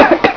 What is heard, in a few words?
SMOKER